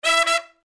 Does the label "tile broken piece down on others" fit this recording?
No